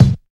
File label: dry steel kick drum sample c key